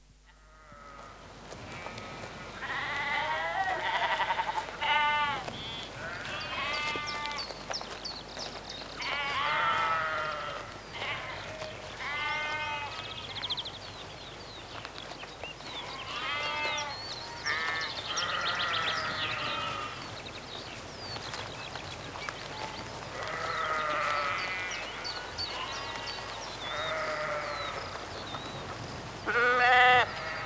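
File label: evening return of sheep; vechernee vozvrashenie ovets; zvuki prirodi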